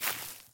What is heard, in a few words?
grass step; Golden Crayon Sounds